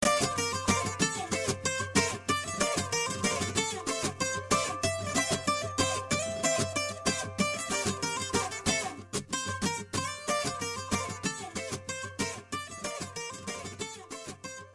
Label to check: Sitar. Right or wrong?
Right